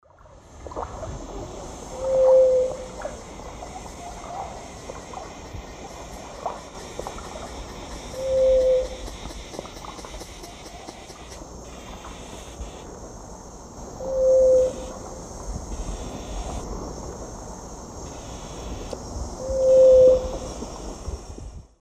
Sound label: Leptotila rufaxilla, song; S. America; Eastern Lowlands